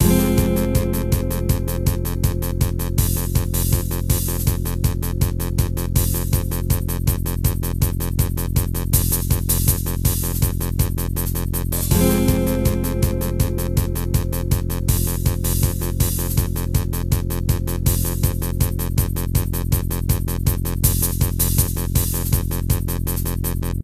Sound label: train trouble; deltarune yellow translation